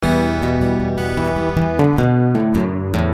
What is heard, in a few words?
Picking Em 77